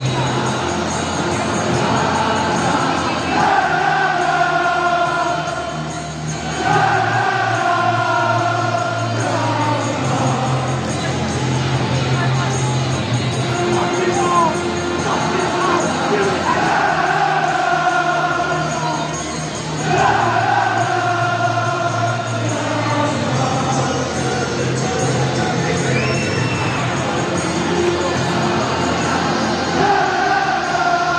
nananana jam tarts with music, chants

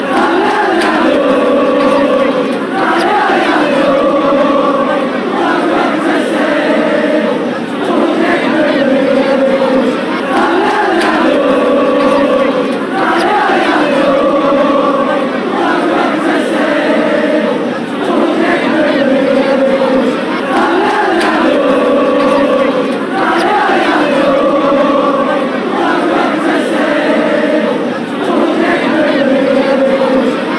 allez allez allez oh, fan chants

